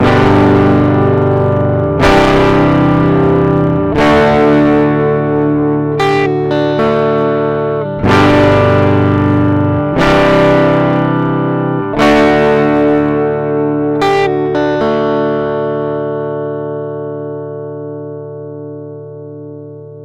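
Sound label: ua710 guitar tube g15; Universal Audio 710 Testbericht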